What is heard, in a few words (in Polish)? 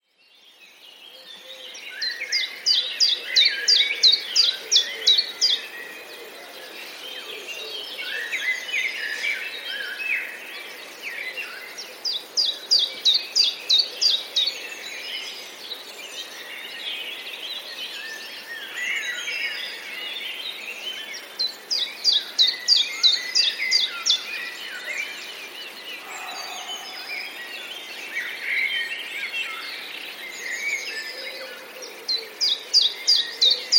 pierwiosnek, całkiem dobre nagranie śpiewającego pierwiosnka